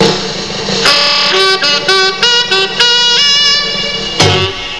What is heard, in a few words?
CIRCUS